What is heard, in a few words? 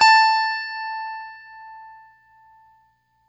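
Stratocaster, Guitar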